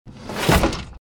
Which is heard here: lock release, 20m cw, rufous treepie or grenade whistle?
lock release